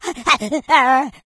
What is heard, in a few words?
ignite, infected, female